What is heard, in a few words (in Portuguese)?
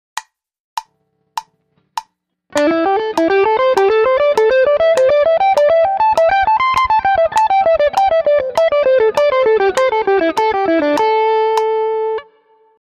Penta 2 Cordas 100bpm